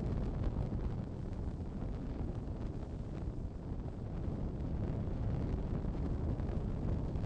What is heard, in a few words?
fire loop small flame; colony; Titanfall 2